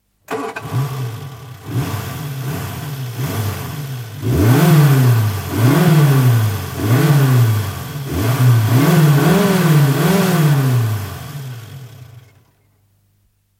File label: motor car; bbc